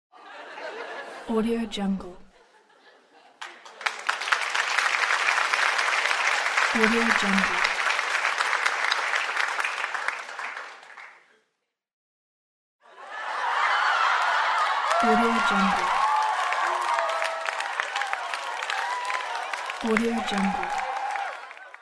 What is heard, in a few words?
laughter applause; an-re